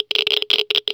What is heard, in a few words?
geiger counter